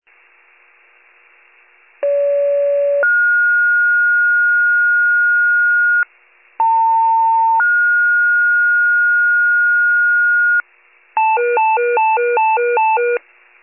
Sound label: fire, pager alert